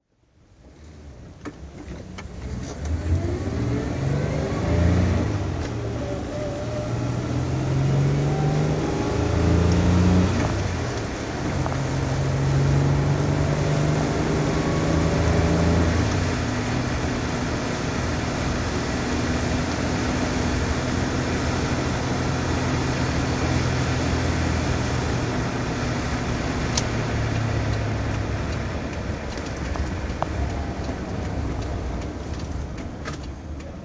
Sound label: daimler 42 coupe drive; Daimler Sovereign 4.2 Litre coupe, 1976; Engine sound driving; V3D/ ClassicarImages